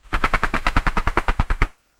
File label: sutile and fast deck shuf, card